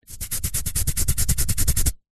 human itch skin; zapsplat

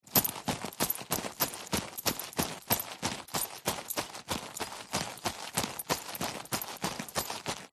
foley luggage leather handbag running movements

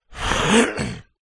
human male cough clear throat; zapsplat

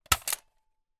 toy nerf gun strongarm fire

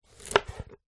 pear chop slice knife wooden chopping board

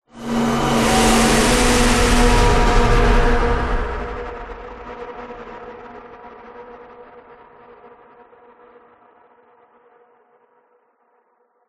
whoosh; orlando lanzini

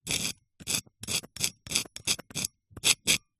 foley rock brick metal blunt scrapes strokes; zapsplat

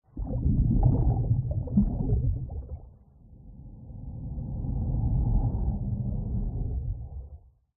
Cinematic Underwater Texture

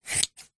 foley long hair cut with scissors single cut; zapsplat

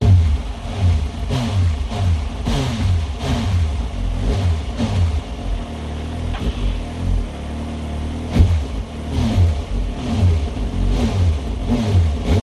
TRANSPORTATION MOTORCYCLE Engine Damage Steady

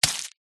foley footsteps barefoot dirt single

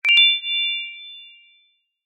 notification alert prompt bright chime ping; zapsplat

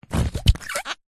cartoon squash deflate; zapsplat

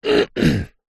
human man clear throat

